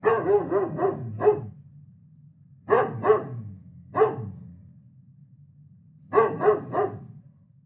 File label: large barks backyard